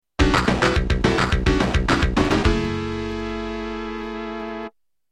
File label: cart music level complete; MEGA CD VERSION MUSIC